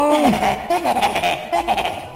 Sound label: laughing